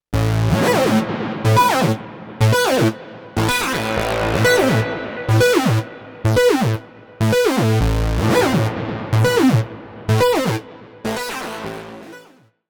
UNO Synth Pro X Presets

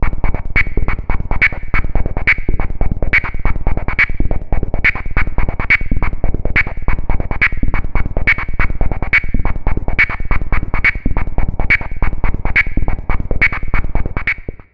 Pocket Operator Modular 400 Noise saw; Teenage Engineering

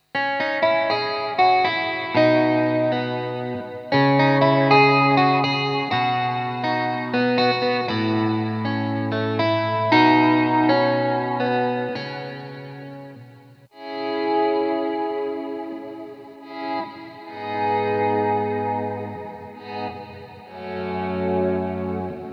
Hohner Clavinet D6

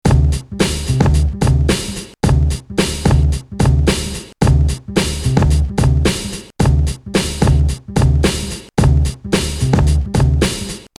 AKAI MPC60

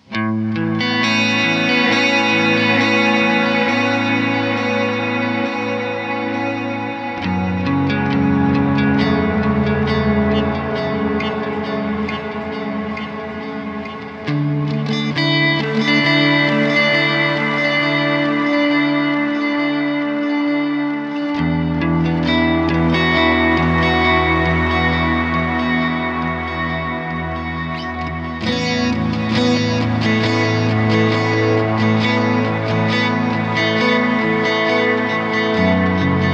Delay Digital Compressor Formant Reverb; EQD Silos

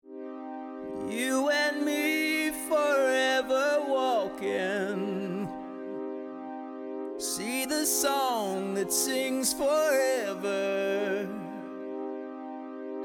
Echo; TC-Helicon Perform-VK Demo

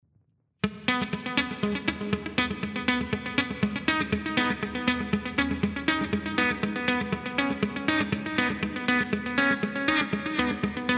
Riff Pro Q3 C2 L2

